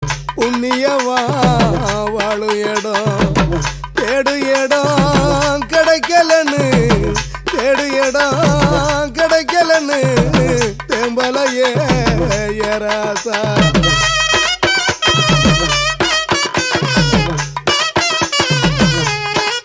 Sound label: Ringtone